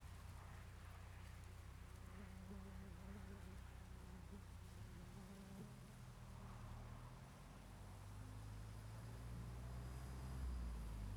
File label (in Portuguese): Caminhao passando pela direita com mosca no inicio; maquinas